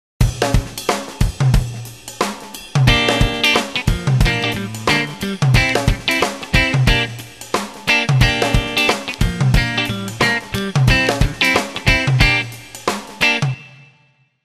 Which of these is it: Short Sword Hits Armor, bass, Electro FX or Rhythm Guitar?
Rhythm Guitar